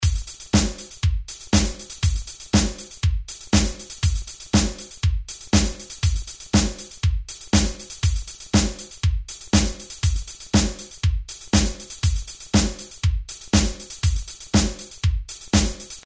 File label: dance, 120